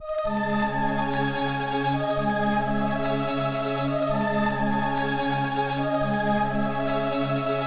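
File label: Ethnic Loop Saz 125 D minor; Ethnic Loop Vibes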